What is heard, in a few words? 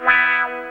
WAHH; E-MU Formula 4000 Series Vol. 1; Hip Hop Nation; Funky GtrLicks